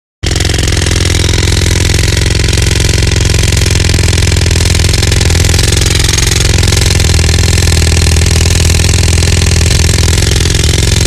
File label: Drill Constant Heavy; drills